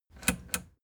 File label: plastic button latch click enable slow